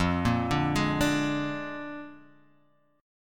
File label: Bb 7th F, chord